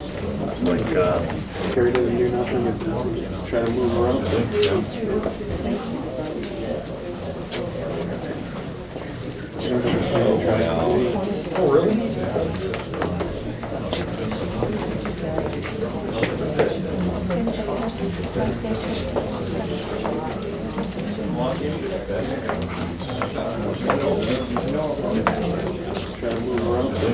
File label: Walla Generic Loop Cl